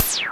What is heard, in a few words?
pew, zaps and beeps